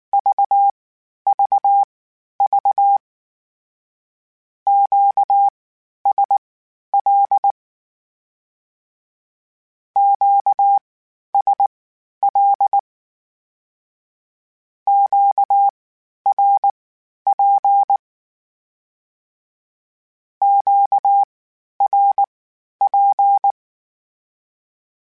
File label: Q Calls Twice spaced, Morse Code; CW Code Groups; HamRadio